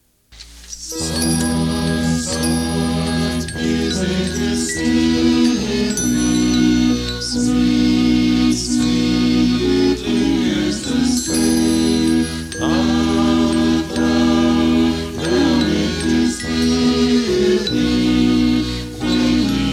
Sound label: Soft Music; Sabbath Meeting